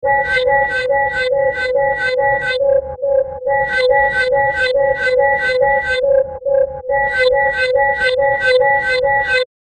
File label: dark psychodelic lsd alie; 140bpm